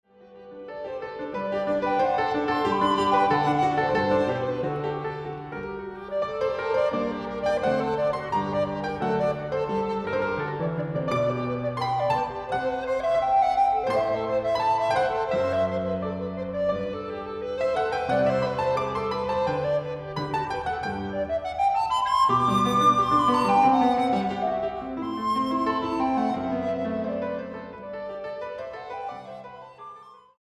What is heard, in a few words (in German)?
Sonate C-Dur KV 296 Rondo Allegro